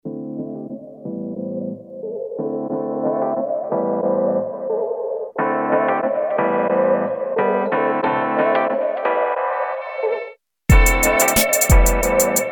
hip hop; laniakea sounds; caviar

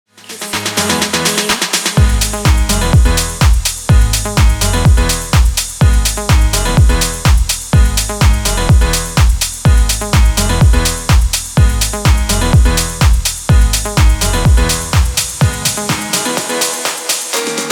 House Pack Mix, live house sound pack; Production Music Live; Fun Times

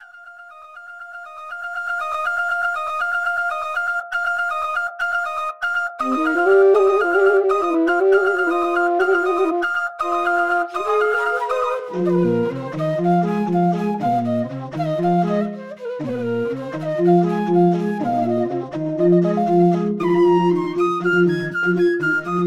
Bansuri; Sonic Collective

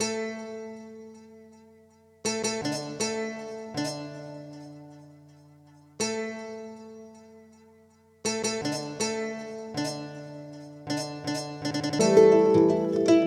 Kora; Sonic Collective

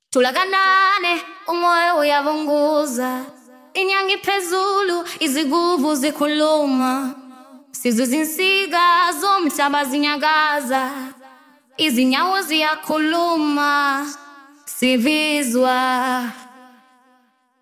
Loopsy, afro house; production suite

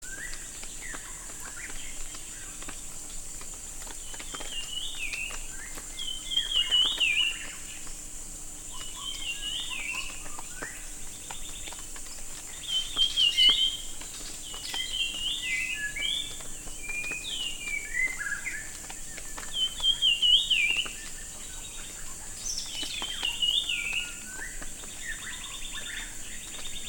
Forest Sound Effects; Sound Ideas

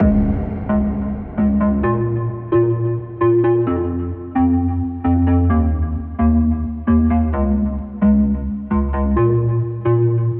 Synths; Evolution Of Sound

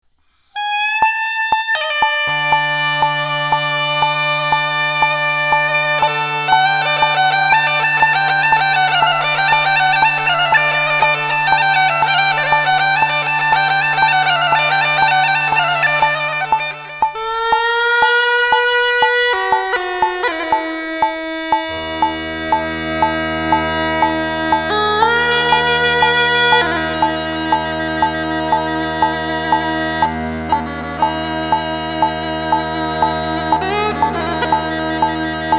Balkan Bagpipe; Pulsed Records; World Series

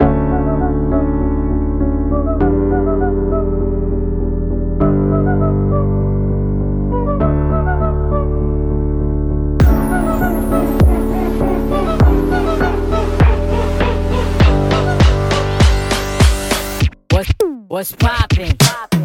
poppin progressions; whole loops; poppin progressions 1

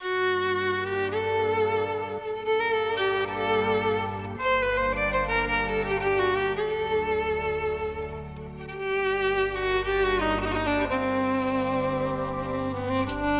Azerbaijani Violin Electronic; Earthtone

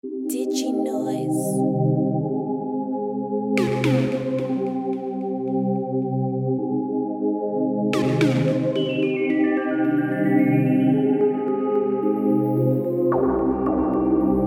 Massive Trap; Diginoiz; Massive Trap Chiefs

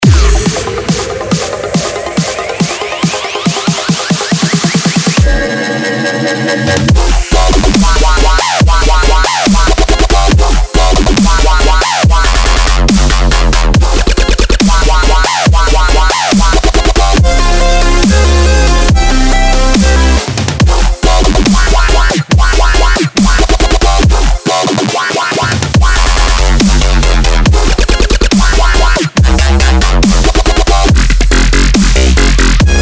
massive presets; Disciple Samples; Virtual Riot

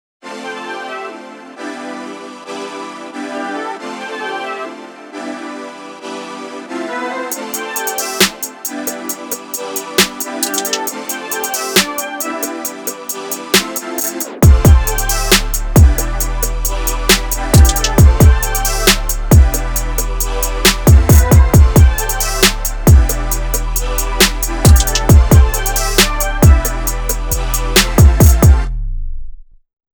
hip hop sample pack; Slate Digital; CAICOS; Mango

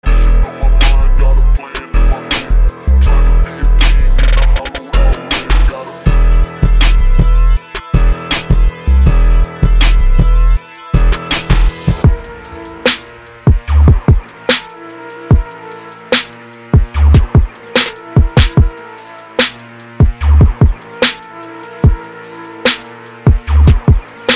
memphis trap; relooped; cash rules

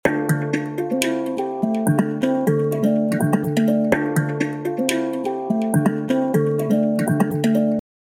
Handpan; Basic Wavez; melodic handpans

